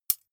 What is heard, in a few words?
midsize metal thin hit; industrie-wirtschaft; baugewerbe